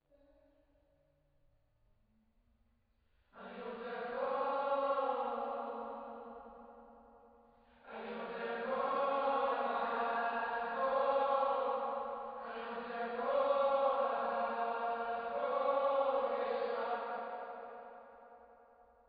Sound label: Choir